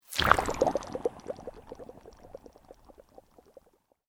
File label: Sweep Organic Medium